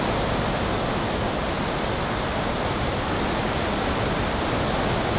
Wind Trees, ambient; Totaled; XBOX

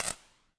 bad zap

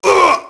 merc get hit; enemy; Max Payne

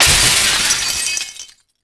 bipod

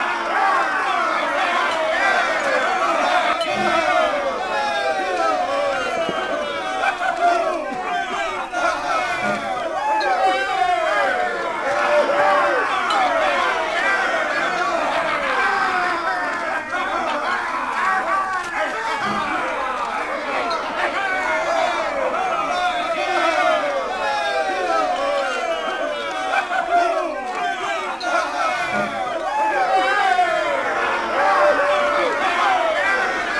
duel tavern amb; Sid Meiers Pirates; XBOX

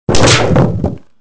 glass hit; Soldier Of Fortune II Double Helix; XBOX

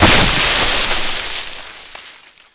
hit leave wall; hits; Tony Hawk's Project 8; XBOX

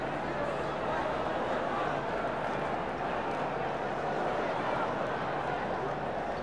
Crowd Ambience; Championship Manager 2006; XBOX

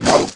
Pirahna Bite; Aliens

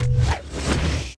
tv zoom out; Tony Hawk's Project 8; XBOX; bedroom

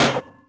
chair iron hit; bouncyobjects; Tony Hawk's Project 8; XBOX

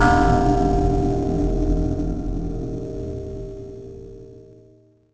bell; The Elder Scrolls III Morrowind GOTY; XBOX

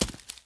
footstep walk vegetation

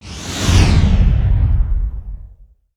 Replay Screen Roll

